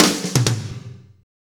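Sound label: slow shuffle, Roland; Northstar; Drumscapes Roland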